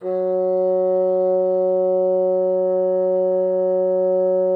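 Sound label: bassoon piano F#3; Xsample VOL-6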